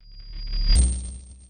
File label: Tool Woosh; lucius iii gamerip 2018 pc linux